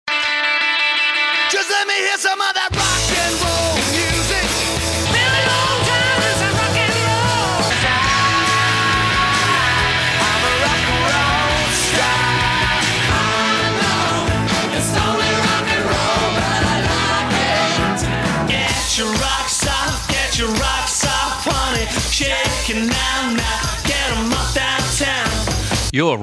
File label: rocks, jingle